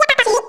cheers; isabelle

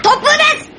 wind; pazu

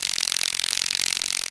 shielder jump; tf2pets; fortnite

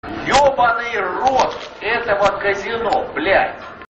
lose, slots

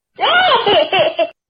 Laugh; JP Patches; Esmerelda

